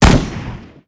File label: SNIPER ATTACK